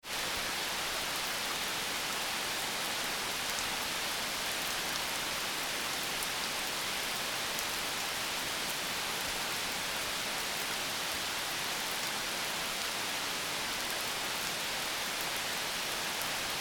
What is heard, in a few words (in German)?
gewitter donner regen